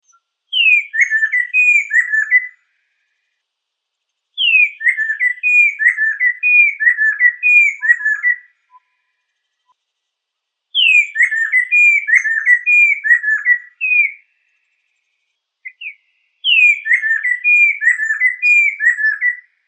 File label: lesser necklaced laughingthrush